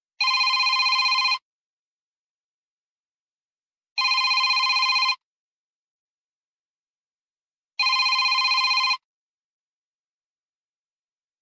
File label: Huawei Digital